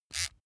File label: razor small short